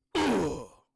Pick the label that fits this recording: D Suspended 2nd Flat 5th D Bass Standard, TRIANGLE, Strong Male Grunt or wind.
Strong Male Grunt